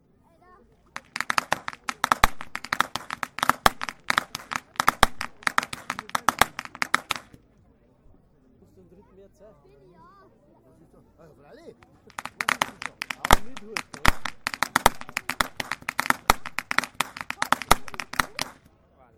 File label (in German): schnalzen, jugend